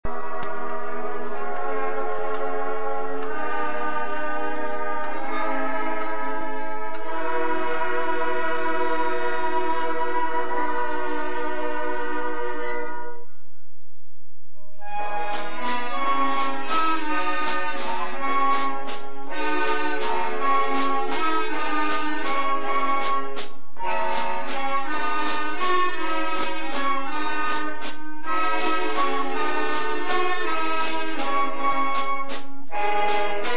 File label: Junior High Band; razorback sounds of 1971